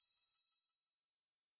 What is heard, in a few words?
enchantment; wagic; mtg